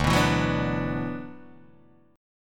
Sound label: D 6th Add 9th D, Guitar, Open D